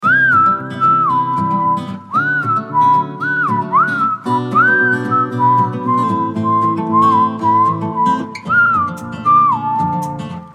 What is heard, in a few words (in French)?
Harmonie instru